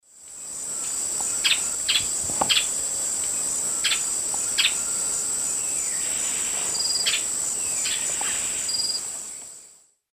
Pheugopedius coraya, calls; S. America; Eastern Lowlands

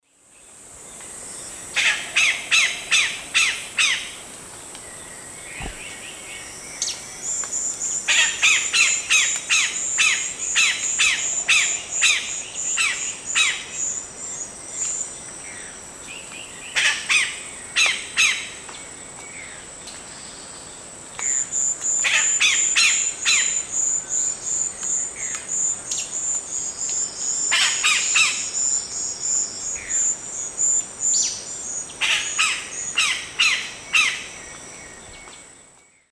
Cacicus cela, song; S. America; Eastern Lowlands

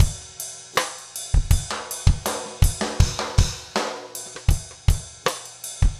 trip hop rims

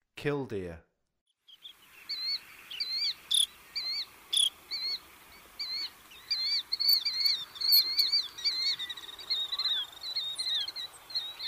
Killdeer; North America; Western USA; Western USA Songbirds

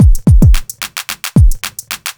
block rock kit

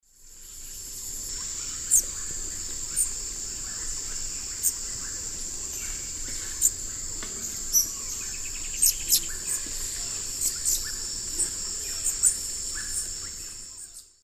Cyanerpes caeruleus, calls; S. America; Eastern Lowlands

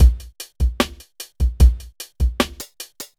hiphop

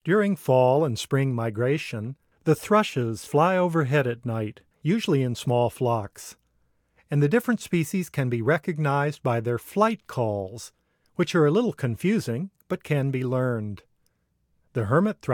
Hermit Thrush Flight Call; North America; Common Western Species; Know Your Bird Sounds

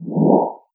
Laser FX; Dance; SFX

